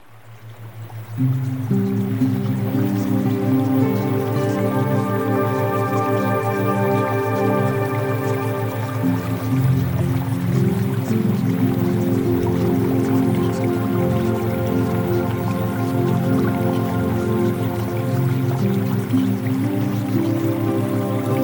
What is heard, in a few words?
Music Theta Waves; Premium Subliminals